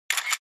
Camera Phone

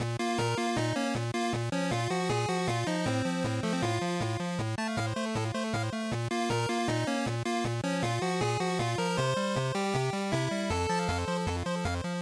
Battle Loop; GB RPG; bgm

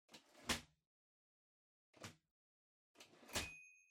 Typewriter Far Tab

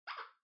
OS Sound (Speaker-Notification)

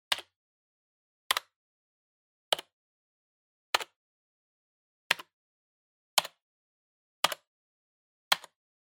PC-Keyboard (Single-Hard)

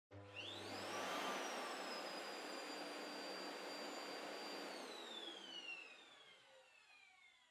Vacuum Cleaner Far Vacuum Low Short

